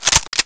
psg1 bolt back

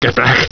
hurt

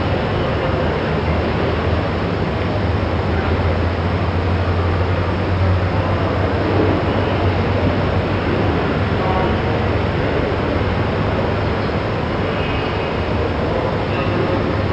station ambience loop

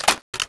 tommy draw, weapons

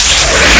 rocket, rpg7

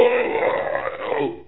zombie grunt idle

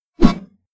sword swing; ragemap2024; grunt

